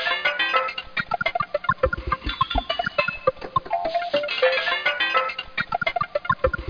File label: Yahoo; Macromedia - Multimedia Showcase 5.1